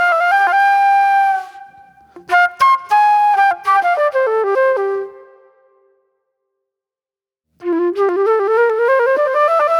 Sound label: Acid Jazz Flute